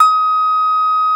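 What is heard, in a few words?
Troubadour Harp A#3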